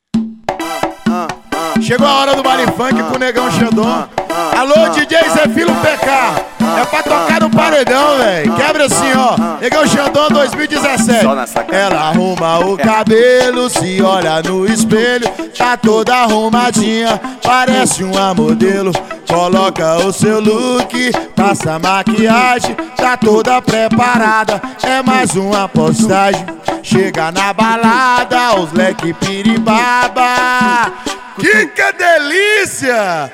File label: funk